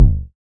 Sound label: Kick; Samplepack Produtique